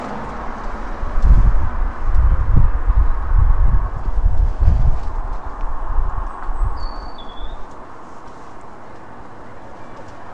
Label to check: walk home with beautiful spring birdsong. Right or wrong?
Right